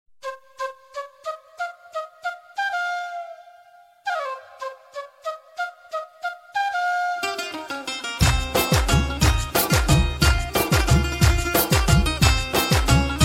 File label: beautiful sweet tone